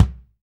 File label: BD, DR BD; Best Service Gigapack 2